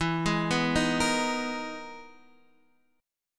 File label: E Major Sharp 11th, Guitar, Drop D; chord shape